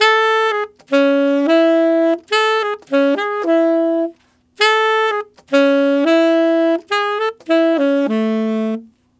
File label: Tenor Latin in A maj, Tenor Sax Loops; Sonic Foundry (Sony Creative Software); Jazz Solos and Sections